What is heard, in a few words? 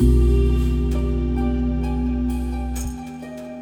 Suspence Chord; BB 2HTC Samples Vol 4; Pads